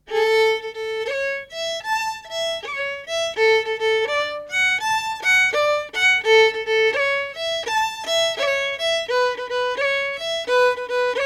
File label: banjo